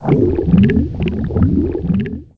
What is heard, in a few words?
swim; zelda; link